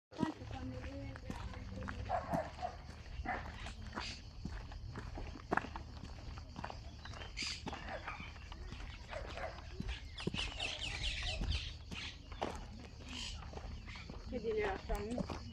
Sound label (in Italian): Passi